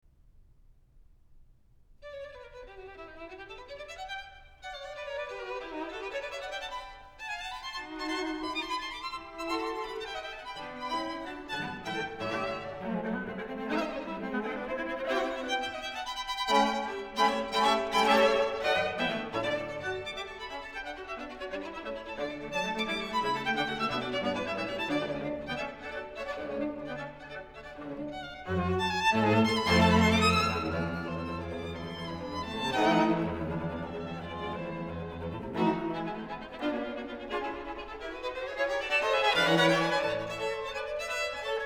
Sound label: String Quartet No. 3 in D Major, Presto; Ludwig van Beethoven; Beethoven - String Quartets No. 1-6, Op. 18